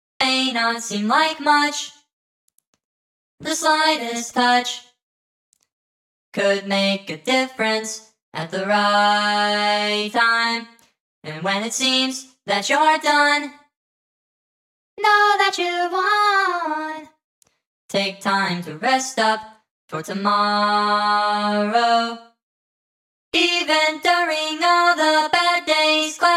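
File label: Music Sparkle Vocal; Mindwave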